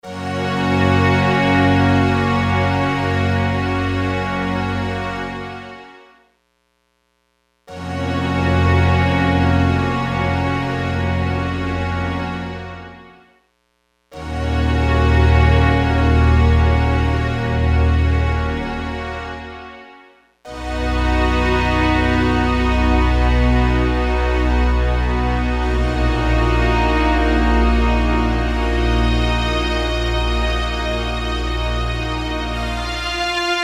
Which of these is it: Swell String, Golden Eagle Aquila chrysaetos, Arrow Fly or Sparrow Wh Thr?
Swell String